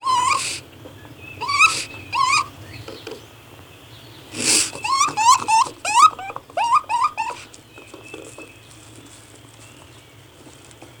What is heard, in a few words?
Animal Raccoon